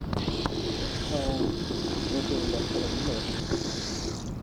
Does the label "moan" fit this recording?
No